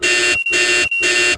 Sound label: critical, hits critical; World of Tanks Blitz